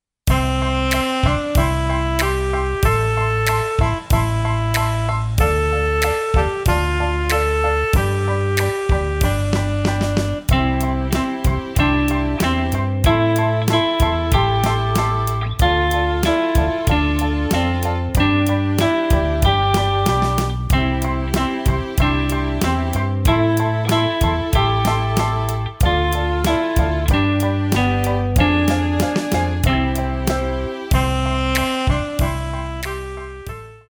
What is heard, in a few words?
Slow Rock